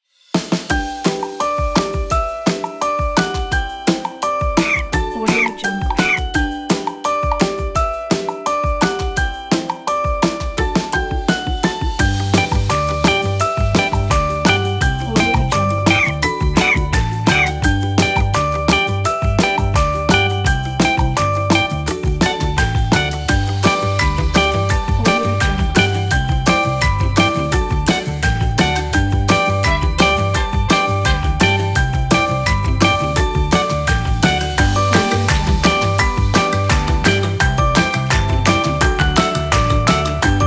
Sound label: funny animals pack